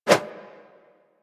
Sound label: Throwing Dagger Idle Light Whoosh; Warframe; Weapons